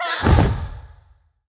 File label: enemy harvester death; sfx